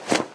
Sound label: cloth large; smite; guanyu